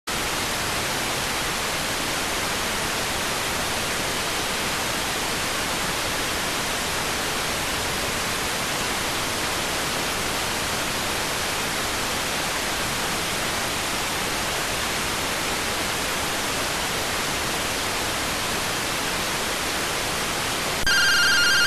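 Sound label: radioteletype receive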